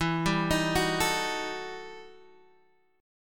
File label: E Minor Major 11th E, guitar